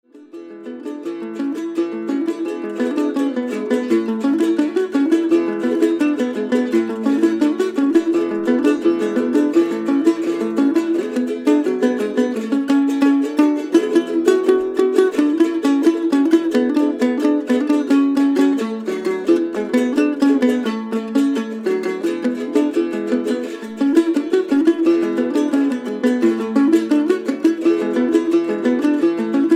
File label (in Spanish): Bamba, Guit